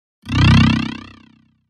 Elect Rattle, multimedia element

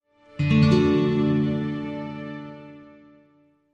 Music Classical Gtr, guitar

